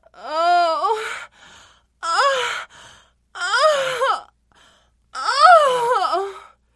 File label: moaning